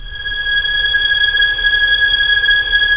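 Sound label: Strings Violins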